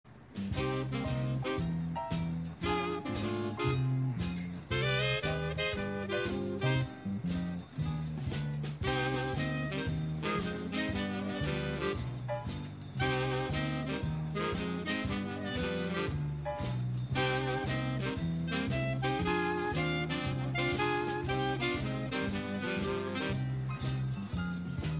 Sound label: Sax